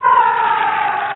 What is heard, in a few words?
Amb Destruction; Red Orchestra Ostfront 41-45